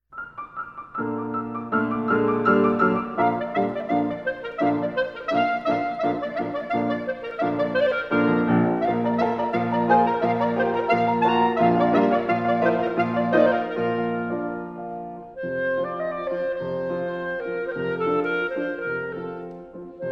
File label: Hebraic Music for Clarinet, Chassidic Chant; II. Suite Hebraic